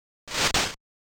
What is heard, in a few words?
slash Hit